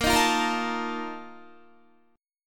A sharp Major 7th Suspended 4th, Guitar; strummed

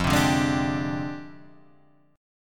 F Minor 6th Add 9th, chord; Fm6add9 Chord {1 3 0 1 3 3} strummed

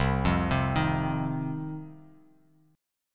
C Minor Double Flat 5th, C, chord; arpeggiated; 8,8,10,8; JGuitar

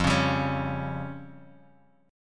F Suspended 2nd Sharp 5th F down Guitar Open G

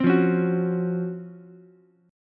Db Major 11th Db Ukulele C6; strummed; JGuitar

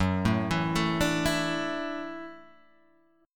Gb Minor 7th Sharp 5th, Gbm7#5 chord; arpeggiated; 2,0,2,2,3,0; JGuitar

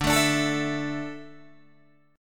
D Suspended 2nd D down Guitar DADGAD

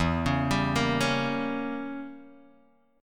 E Diminished 7th E, Guitar Drop D

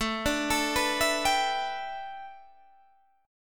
A 9th Suspended 4th Guitar Open D, chord

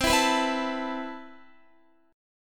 C Major 13th, Guitar, chord; strummed; CM13

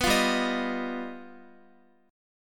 G Augmented 7th, Guitar; strummed

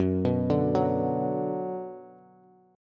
Gb Suspended 4th Sharp 5th, Bass; arpeggiated; JGuitar

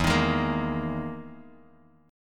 E-Major Sharp 11th E, Bass Standard; EM#11 strummed; JGuitar

